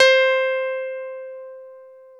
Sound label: PNO CP70; Club-50 - Foundations Roland; E. Grands